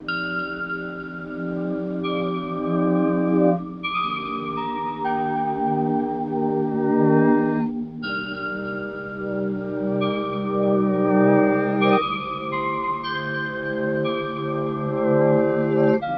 Cassette Mallets

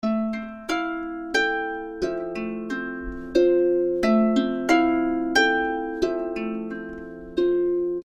90 C Harp

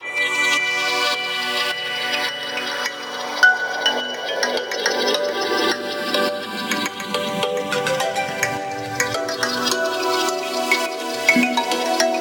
Ethnic Pads CHILLOUT; Zampler

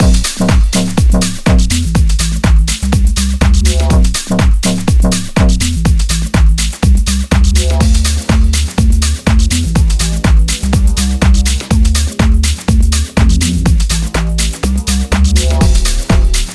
Bass And Trap

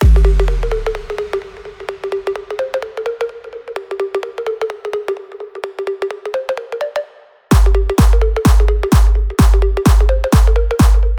EDM Kicks; Scott Diaz; BigKick Expansion

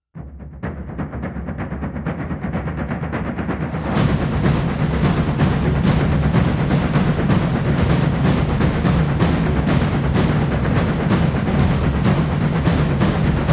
Guitars Synths; pluginboutique

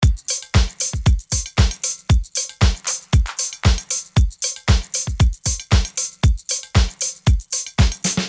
116 Drums Full

